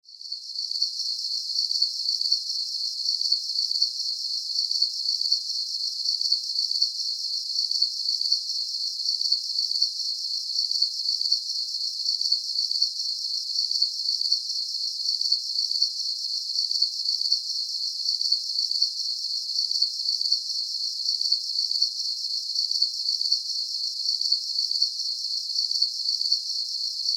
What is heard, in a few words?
Jungle Night, Ambience, Jungle, Night, Chirps, Insects, Bugs; 5.1; Surround Sound; BlastWave